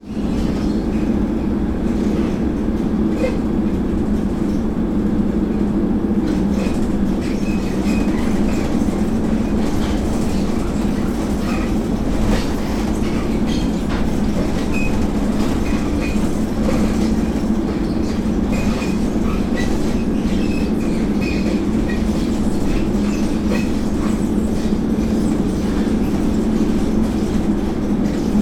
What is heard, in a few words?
transport train channel tunnel uk car shuttle internal moving squeaking